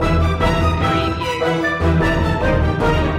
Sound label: neon nightscape distance loop